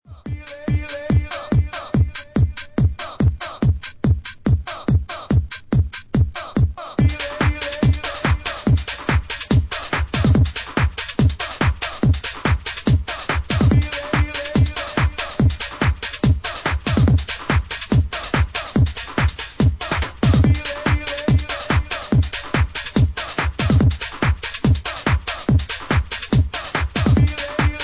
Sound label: Bassline Burn